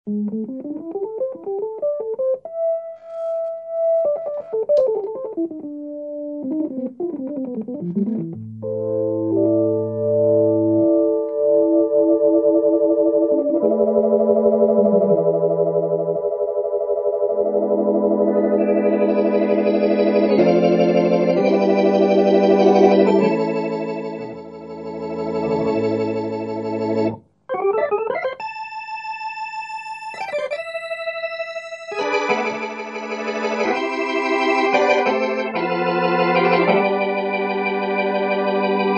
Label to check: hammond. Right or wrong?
Right